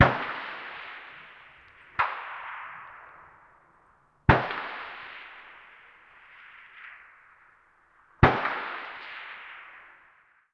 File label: combat atmosphere; World of Tanks